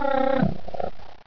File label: houndeye die; hl2